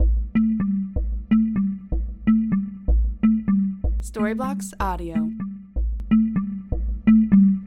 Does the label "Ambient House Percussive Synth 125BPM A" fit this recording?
Yes